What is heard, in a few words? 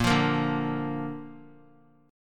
A sharp Major; strummed; JGuitar